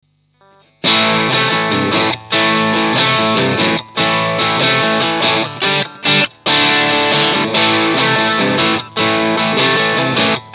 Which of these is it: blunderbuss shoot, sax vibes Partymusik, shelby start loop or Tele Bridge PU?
Tele Bridge PU